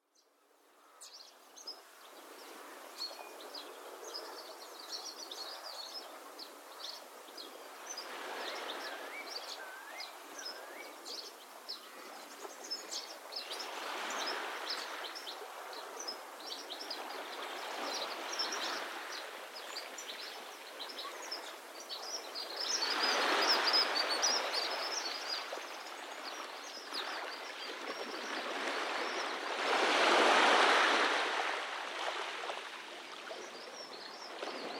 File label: Carrick Shore Soundscape